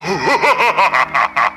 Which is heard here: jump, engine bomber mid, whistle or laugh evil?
laugh evil